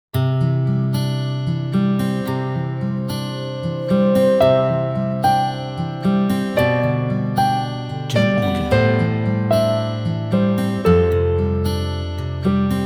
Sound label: Soft Guitar Piano